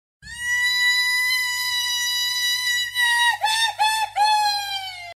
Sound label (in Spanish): Sapucay gaucho